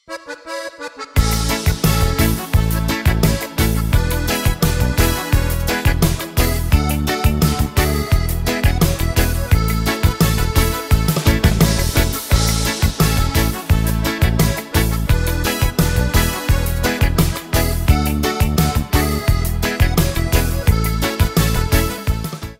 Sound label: Yamaha GENOS